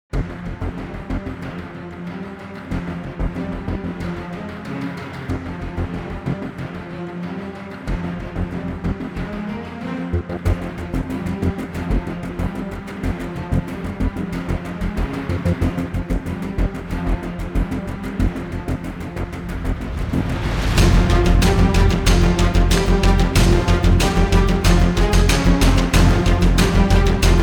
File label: combat